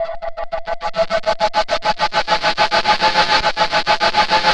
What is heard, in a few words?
HYDRATOR; Spectrasonic Distorted Reality 1